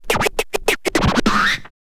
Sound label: scratch short, Vinyl scratches